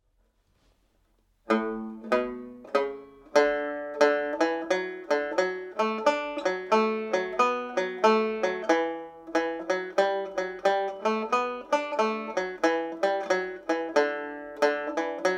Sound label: Reel slow, banjo; Donegal